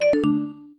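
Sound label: reverse pitch, Variations on a ringtone; Reversed tones; tri-tone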